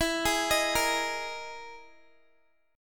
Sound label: E Major 7th Flat 5th, Guitar; arpeggiated